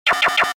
Science Fiction Sci Fi Electronic Short Computer Calculation